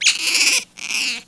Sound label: hurt, Spinner